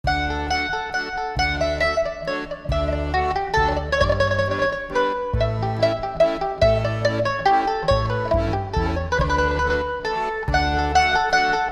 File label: mandoline